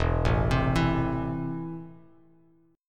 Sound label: E Suspended 4th Sharp 5th, Bass Standard; arpeggiated; JGuitar